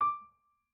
piano

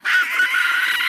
Scream, F#5; RAW; 音MAD; 孤独摇滚

